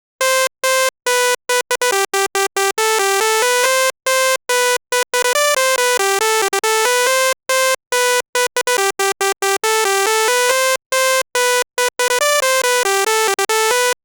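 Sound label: 140 BPM Melody no sidechain dry; Vengeance - Essential Clubsounds Vol.5; Demo Constr Kits; Nessi Kit Root A; Synth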